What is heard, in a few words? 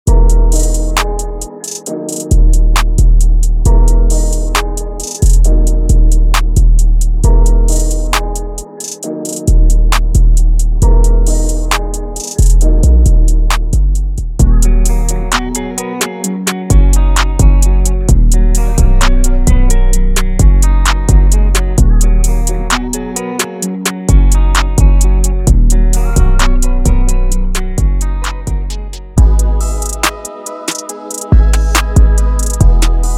trap drum kit; kvass lab